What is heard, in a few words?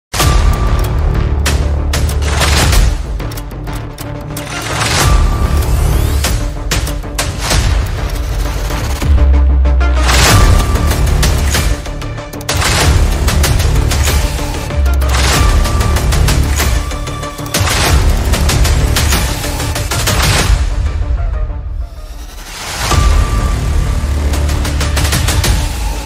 agent movie teaser announcement bgm ringtone